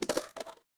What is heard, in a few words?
impact crash